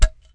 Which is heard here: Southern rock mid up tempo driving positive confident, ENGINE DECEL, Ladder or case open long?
Ladder